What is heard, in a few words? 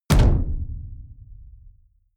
sci-fi space pirate gun fire resonance; pistolen gewehre